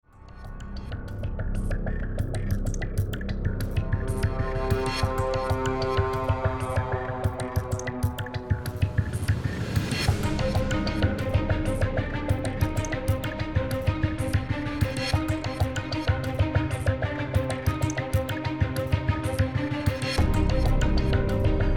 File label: Music Sample; SlimUI; Modern Menu 1